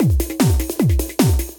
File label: cool beat; Undertale